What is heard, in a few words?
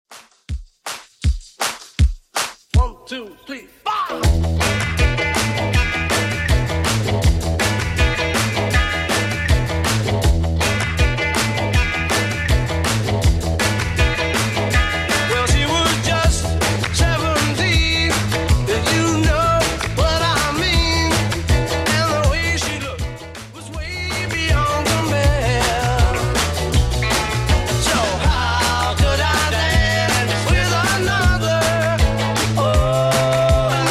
60s Redrum 160bpm; remixplanet